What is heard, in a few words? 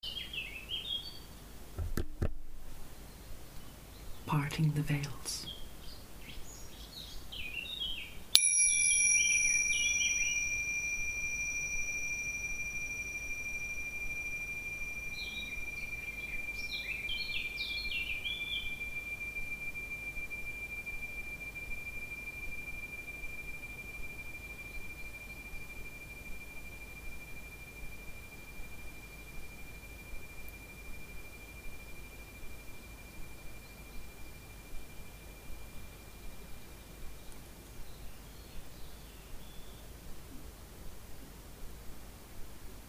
bells; Parting the veils